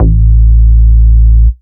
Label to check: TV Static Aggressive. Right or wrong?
Wrong